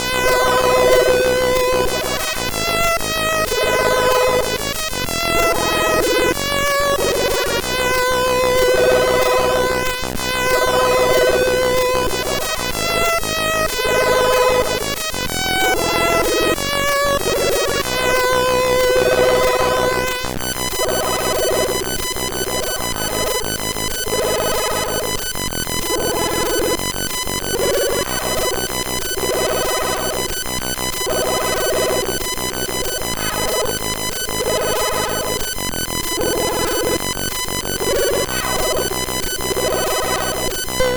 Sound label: Jingles; MOS6581R4; hvsc